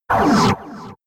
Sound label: Lick